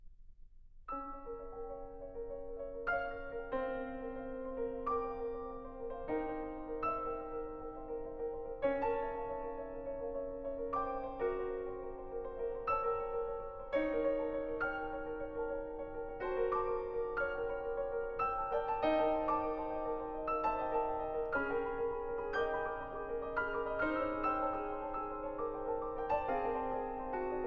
etudes pour piano; entrelacs

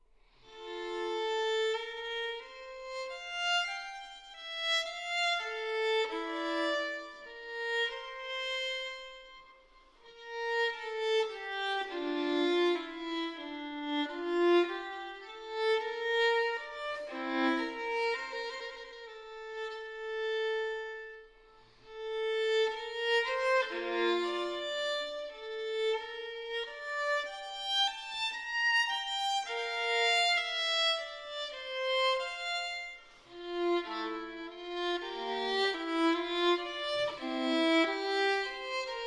violin sonata in c major largo; violin sonata no. 3; bwv 1005 iii

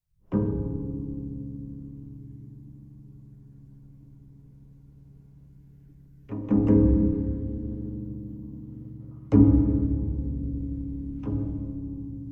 double bass concerto

